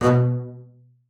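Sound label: marcato B2 right, bass spiccato; Xsample VOL-5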